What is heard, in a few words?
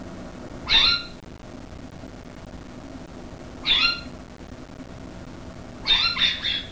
scrub jay